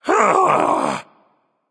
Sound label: sigh, voicepack; smite; jorm